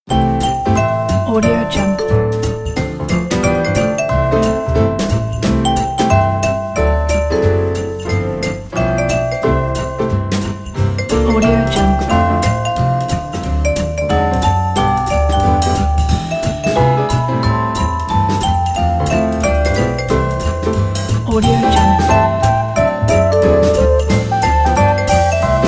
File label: christmas jazz